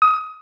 click short confirm; Osu!; HentaiKontrol

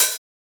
soft hit finish; Osu!

